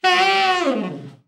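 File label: TENOR overblow FALL, Sax; Giga Samples Collection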